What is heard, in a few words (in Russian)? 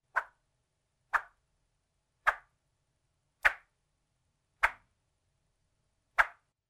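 звук рассекания воздуха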